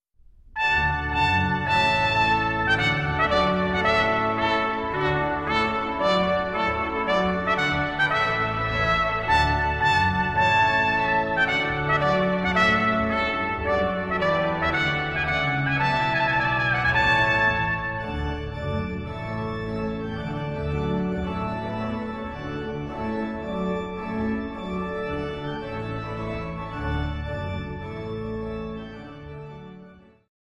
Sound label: Wedding Trumpet Tune